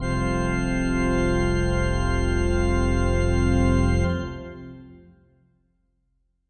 Heavy Pipes F, Heavy Pipe Organ; SYNTH KEYS

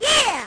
lofi sega sample; Lofi Sega Samples

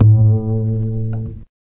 Upright Bass Notes

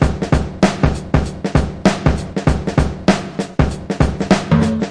break it down, drum loops and breaks, 098 bpm; 091 to 100 bpm

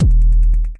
kick kick with hat echoes; Kicks; single hits

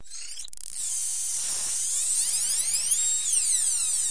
hyperspanner tooth decay; Electro and Synthetic

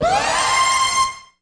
rave alarm; Alarm Sounds

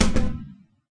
yoga ball wall, Big Rubber Yoga Ball

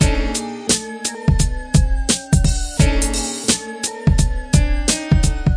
86 dissonant piano hip hop, drum loops and breaks

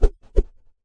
kung fu staff, Kung Fu Staff Swings

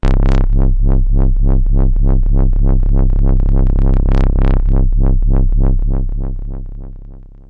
overloaded C1, Overloaded Unstable Synth Bass

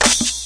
snare

